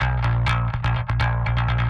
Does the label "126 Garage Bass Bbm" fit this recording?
Yes